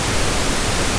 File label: noise